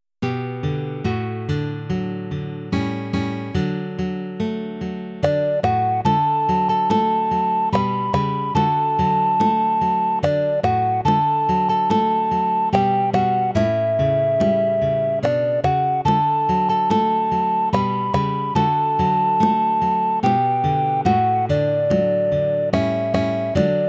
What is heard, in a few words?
Kumbaya accomp